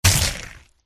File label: bat attack contact middle